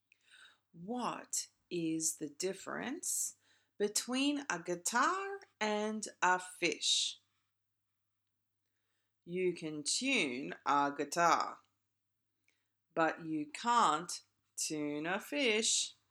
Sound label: Fish and Guitar